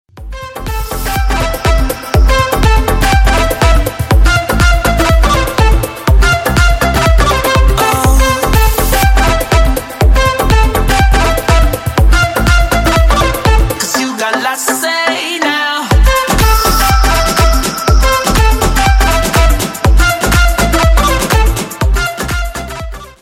dance ringtone

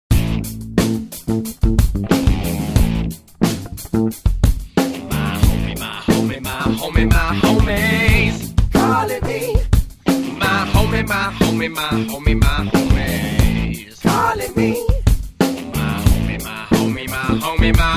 funky ringtone

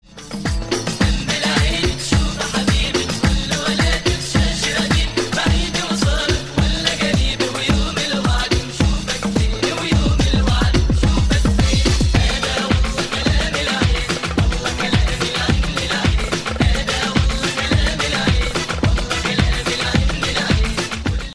arabic arabisch mix ringtone

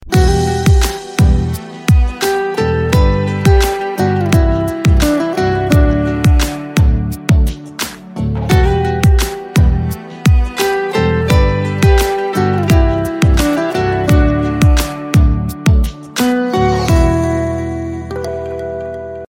ringtone

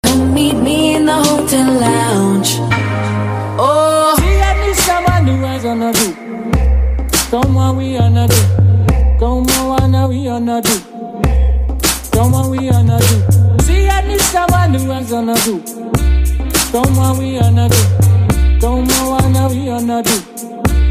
lounge ringtone

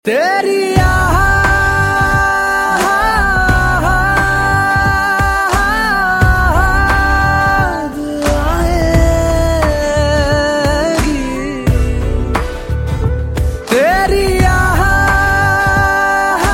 hindi ringtone

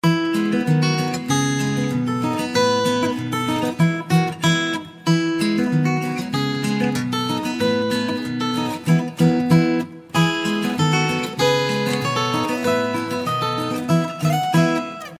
guitar pop ringtone

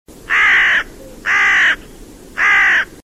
crow notification rington

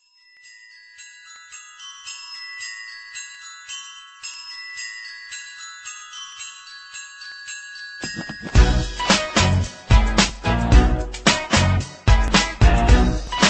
ho ho ho ringtone

